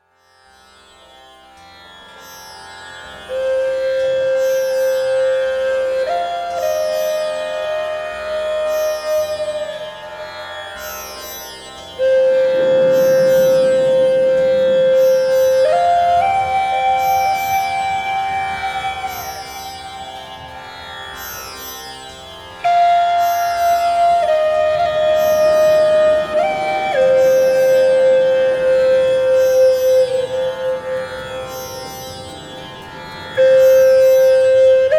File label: Healing Rain, Asian Zen Spa Music Meditation